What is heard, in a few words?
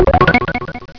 gate; spectrew